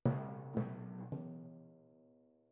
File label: Timp Close